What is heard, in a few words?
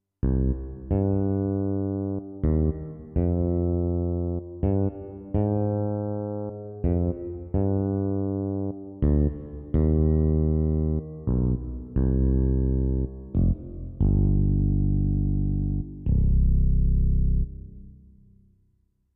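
Finger Slap, digital piano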